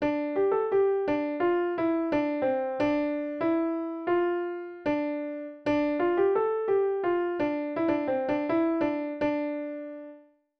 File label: simple piano melody; Songwriting challenge